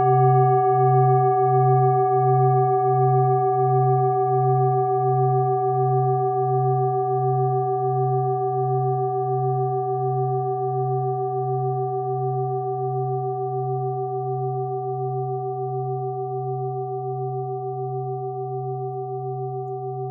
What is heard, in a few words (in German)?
klangschale set; Klangschalen-Chakraset N°7 für alle Chakras